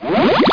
mine; warpath

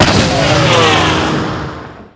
nebelwerfer, weapons; Spearhead Demo

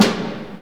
snare; DirectX SDK; DMusProd

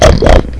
Rhino; Safari II

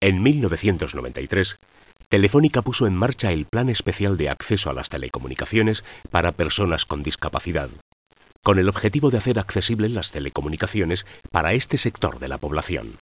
pad; Super League

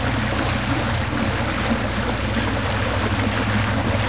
bilge loop; SpecOps2 MPlayer Demo

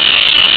Wand Lightning; Mythos

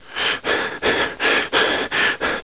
PANTING; Kids' CD-ROM Bible Fun Pack; Education Publishing Concepts; NOAHDEMO

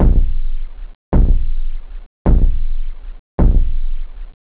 core, drums techno; OctaMED SoundStudio V1

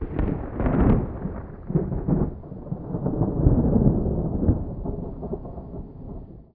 Empty Recycle Bin; Star Wars; Racer

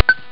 Pawn; Hyper Stacks; Pacific HiTech; Science; Chemistry; Thermo

